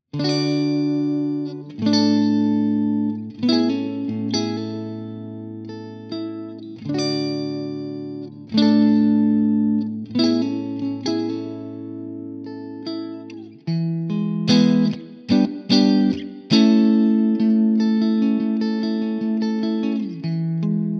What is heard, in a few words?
clean; jensentone